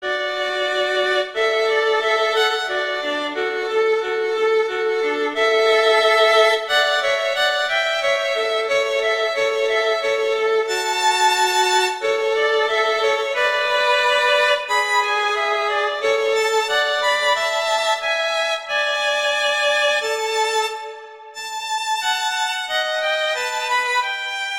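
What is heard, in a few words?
Duet, horn; Mozart Duets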